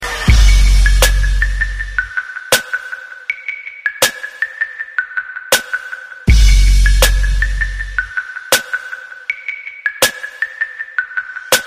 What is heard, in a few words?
hip hop bass ringtone